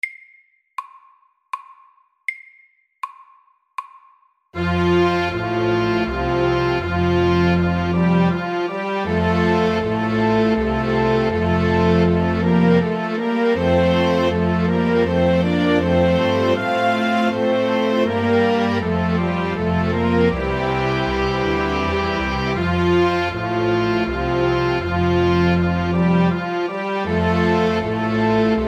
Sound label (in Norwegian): Menuett SAKTE